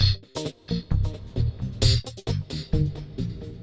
Funky 125-132 Cm7

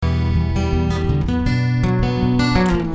Picking Em,Bm 83

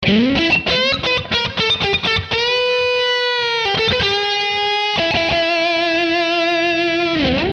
115 solo

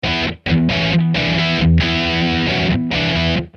E6 135 chords Metal

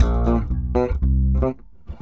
C 120 Steppin Funky

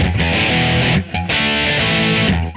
E4 95 chords

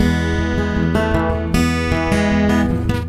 Picking G

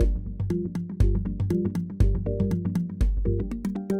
Mallimban Gm7 120

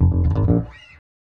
Bb 120 Funk Rock

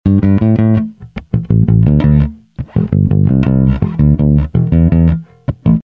Bass A,D,C,D,E 084, Electric

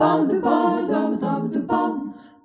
Jazzy Harmony

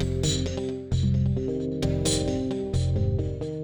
Funky 125-132 Fm7 9

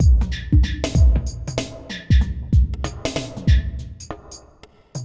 Deep Mover 95

